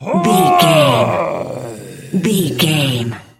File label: voice male growl effort